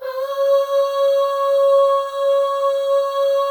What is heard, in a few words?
AHS, FEM; Spectrasonics; FEM.2 AHS